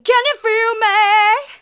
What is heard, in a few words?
Female vocals and robo-vox